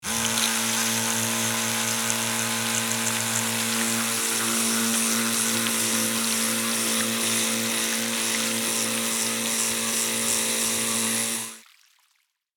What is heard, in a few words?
Toy boat in water remote controlled; rc toys sound effects